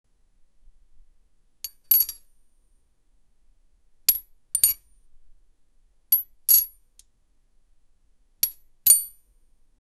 metal clipping together

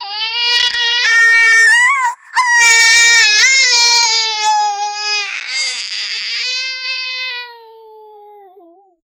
child crying, infant

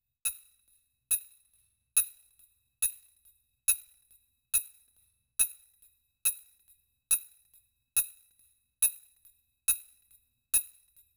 diamond necklace hologram

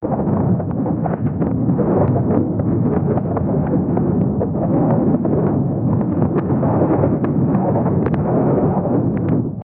pirate ship crew sail in

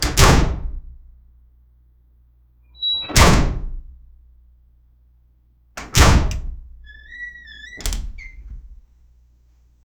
metal door closing

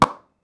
single pinball hitting a

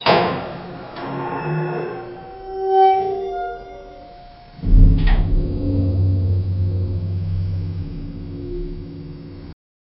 ambient familiar empty, foley

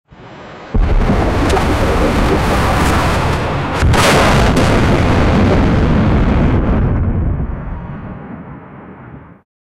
ship sinks and sounds sta; submerged

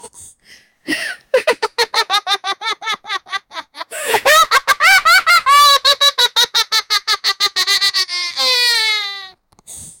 a boy is laughing louder

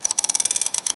spin reel slot machine; games

